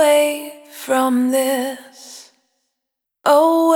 Jericho, Single Voice Melodic Loops; Sony Creative Software; Pocket Diva World Class Vocal Samples

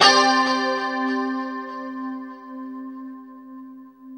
2CHD C 5 -R, Guitars; Spectrasonics; Hans Zimmer Guitars VOL-1; JC-SHEEN

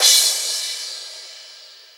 Crash, Drums Cymbal

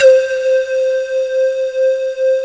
MALLET C5; MALLETTE

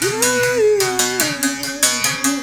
BERIM GRV, BERIMBAU; Spectrasonics; Heart of Africa 2

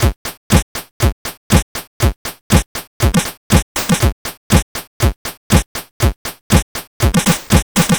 C64 Beat, chiptune drums; Commodore 64

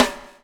BUBL; Spectrasonics - Backbeat

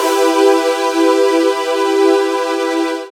SYN PAD, dance synth; Sound & Vision - Gigapack I CD 2 (Roland)

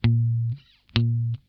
NOTE SCALE, Funk Guitar; 90 s Sample CDs; Zero G

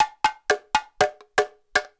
120 WOOD

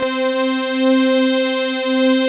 FAT POLY; Pro-Rec - Synthsonic

